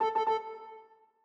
Hardware Fail, sound scheme; Longhorn 7ista Sound Scheme by David Mounder

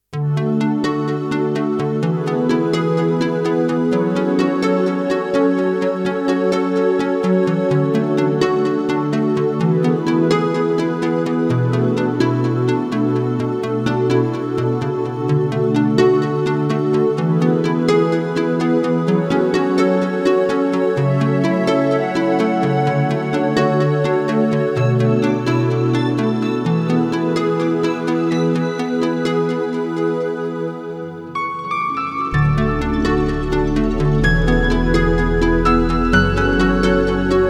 Soft Dream (Piano Melody); Vaporwave

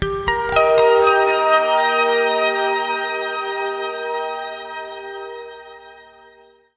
medal gold, harmonious; Audiosurf; chillout

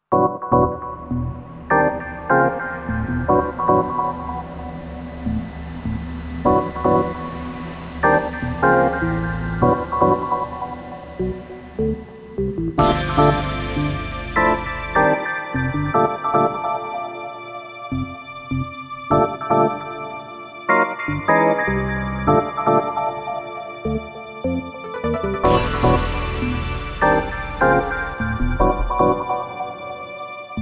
Jungle Dub (Organ Melody); Vaporwave

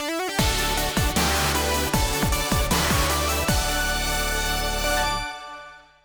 Jingle; Sonic & All-Stars Racing Transformed - Original Sound Version

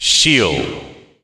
appear shield; Drunken Robot Pornography; Inago Rage

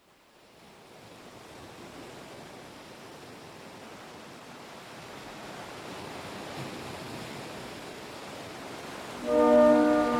Orchestral Intro

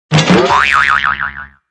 boing

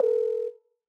Ring Out; Longhorn 7ista Sound Scheme by David Mounder

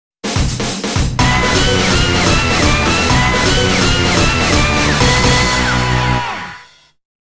Jingle - Big Swell Clear

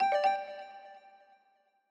Foreground; Longhorn Valley Sound Scheme by David Mounder

